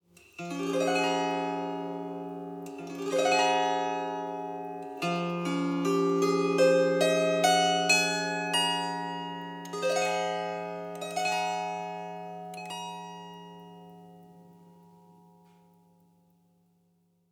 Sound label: Beginner's SACRED SCALE Harp